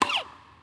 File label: Click